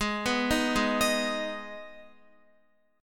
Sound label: Ab Minor chord, Guitar; arpeggiated; x,11,9,8,8,10; 4E,4A,5D,5G,5C,6F